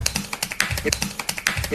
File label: clap sound